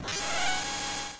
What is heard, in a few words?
suck; Super Smash Land